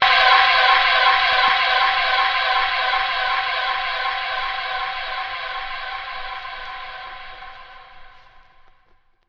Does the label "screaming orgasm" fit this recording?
Yes